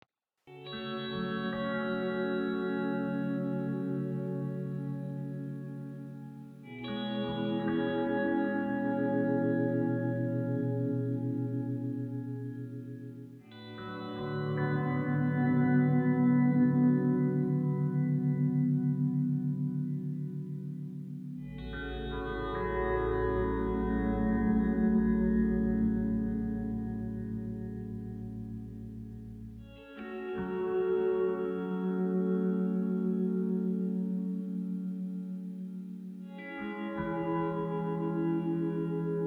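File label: Street Guitar Electric Ambient, 100 bpm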